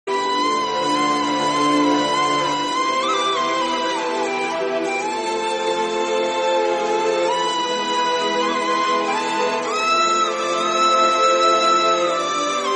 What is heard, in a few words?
instrumental song